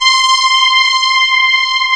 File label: CMI ORGAN